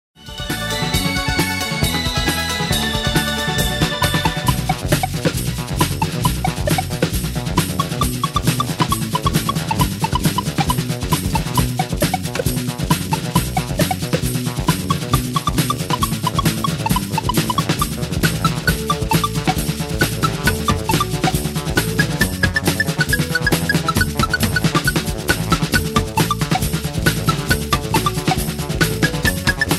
Instrumental

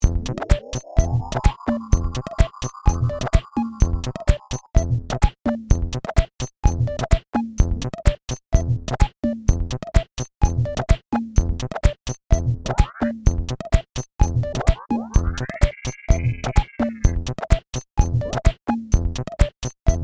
glitch beat no fx; glitch Pack

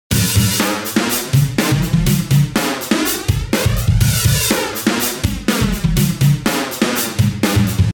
123 FLANGER LOOP HAT SLOSHER

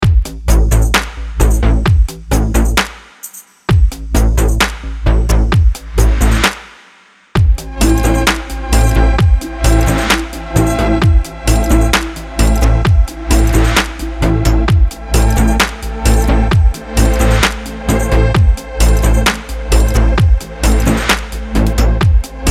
Pulsing Waves

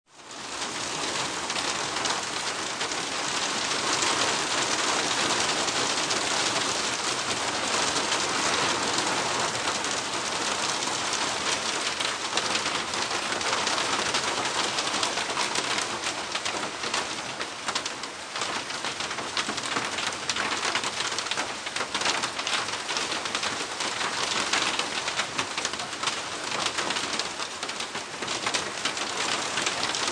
Dawn rain Australia; Southern Highlands, NSW, Australia - dawn rain; 34° 36' S; Daniel Blinkhorn; Solstice Sounds Project

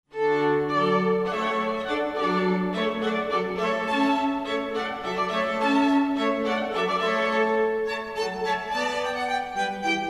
hornpipe

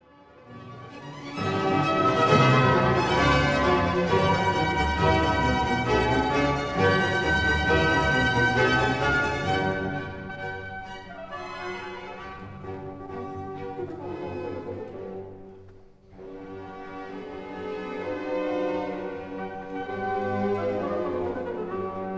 Orchestra; Mozart Jupiter; Bruggen

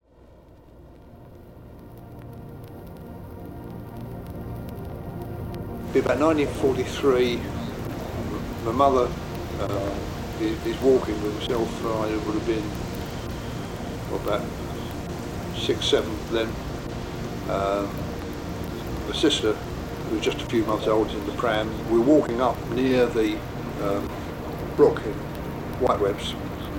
Gunpowder Park

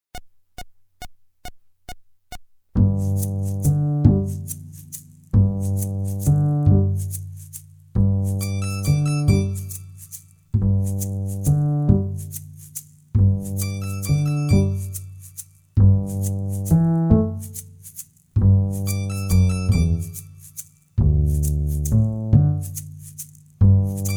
Minuet

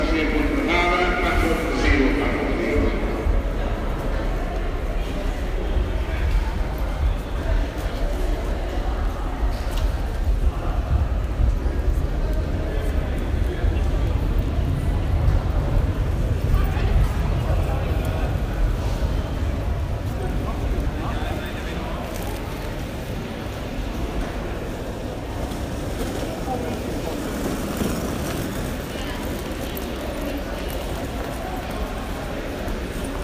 Cardiff Station

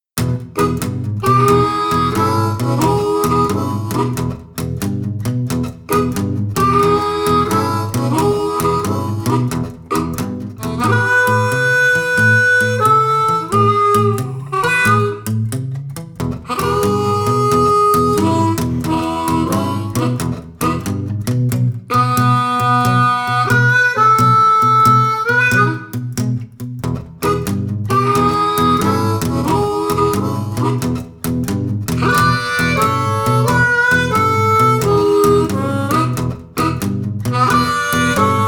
Front Porch Blues

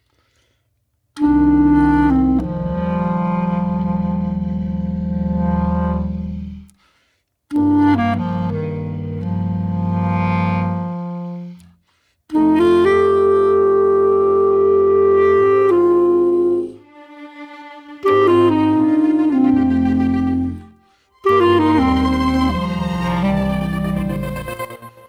Untitled for Clarinet and Vocoder

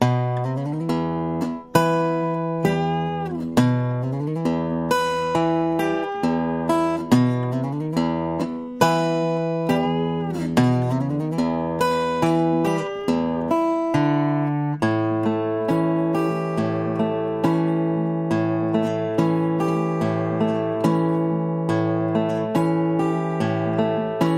Railroad blues slow

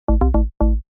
Alert; Haaretz

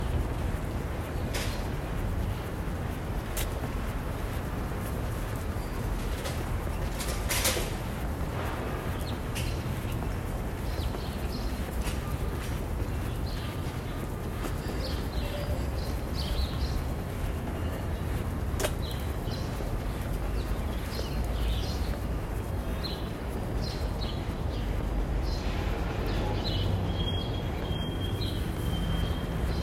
Walking down Horatio Street, New York City

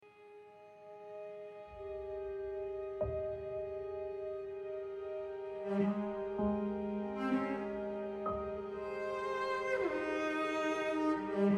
Forces of Attraction (Romantic, Energetic)

